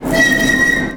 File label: chme3 swist